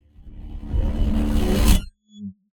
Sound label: electric energy ambient warble powerup; sonniss gdc